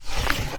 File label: Woosh